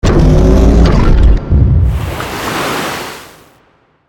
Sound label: waterstep; termination; goliath